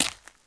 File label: step; KINGPIN; player; gravel